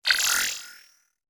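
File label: staff, impact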